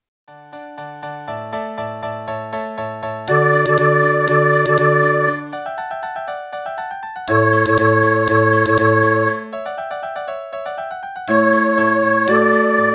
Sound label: soprano